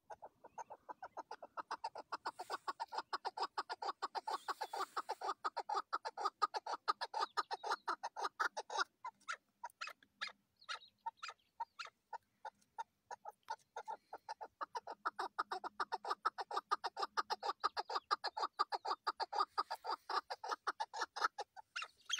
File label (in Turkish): Keklik sesi, partridge sound; Keklik sesi dermandır; звук куропатки zvuk kuropatki; صوت الحجل sawt alhajal